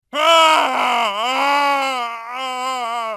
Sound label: scream; mkx; quan chi